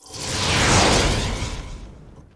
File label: transformation; RPG Maker; Legend of Heroen v1.20